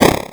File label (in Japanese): garagara